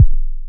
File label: kick